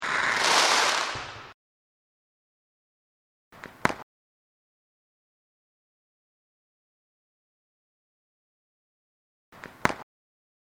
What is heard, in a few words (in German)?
feuerwerk desnsibilisierung